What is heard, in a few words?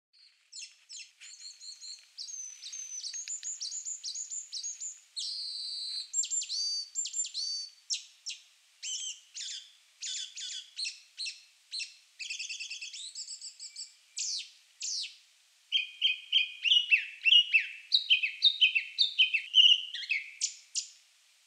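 Japanese Thrush; kurotsugumi; nara; koe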